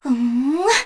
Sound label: Casting, Vox; en; Kara; kingsraid